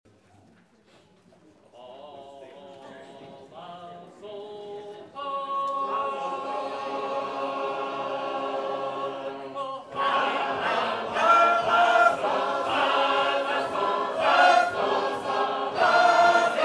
Reese

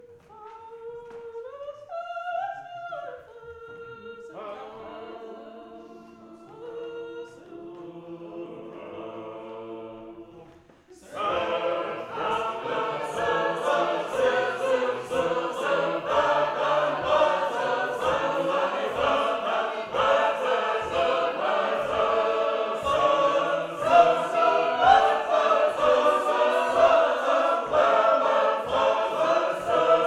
Few Happy Matches

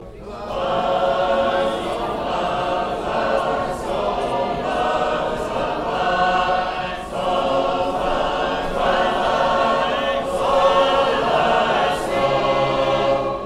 Farther On, Singing; Hoboken, GA

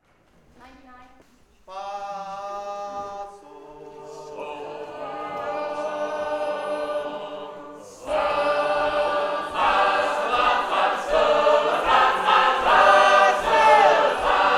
Gospel Trumpet

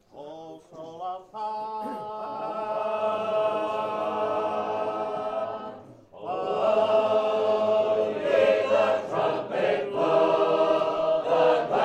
Blow ye the Trumpet; Lenox

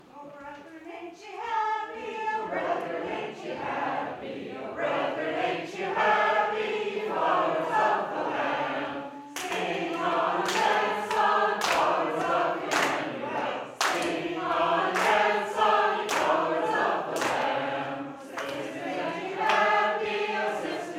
Followers of the Lamb (Shaker Tune)

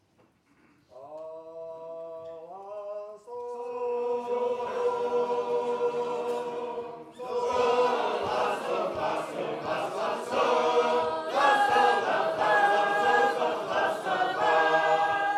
Harmony

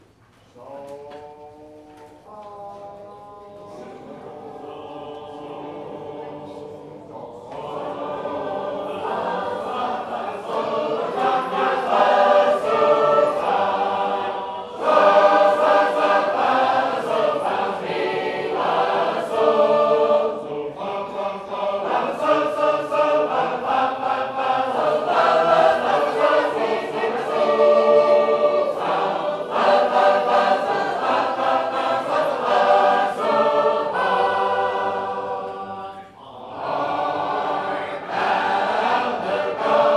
Gospel Trumpet

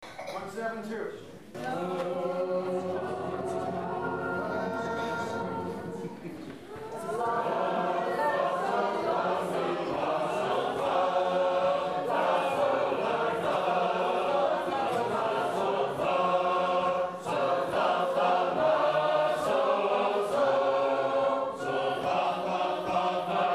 Harmony

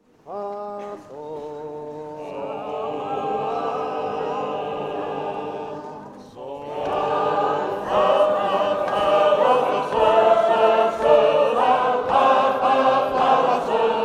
Few Happy Matches

